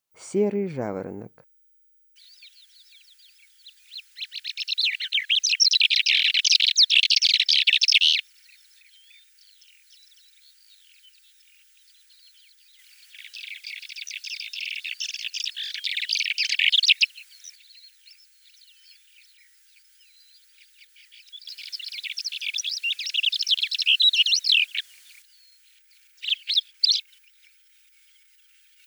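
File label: lesser short-toed lark voice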